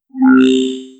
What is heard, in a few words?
short soft and deep techn